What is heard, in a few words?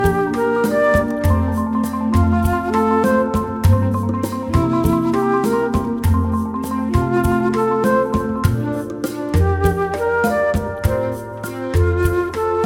Medley